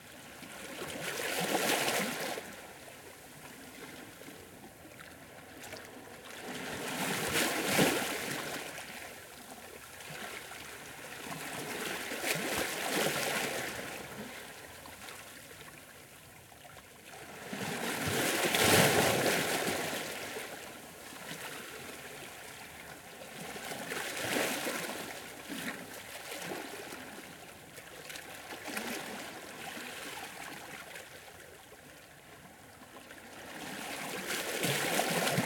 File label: Ocean Waves Gentle Rocks; justsoundeffects